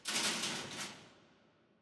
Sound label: ambience room torture cage